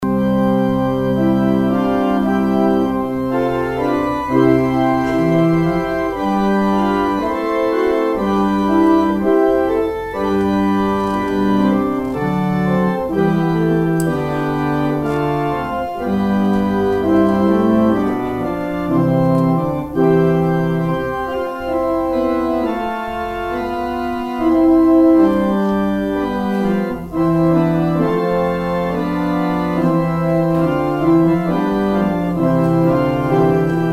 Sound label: organ outro; Organ exit music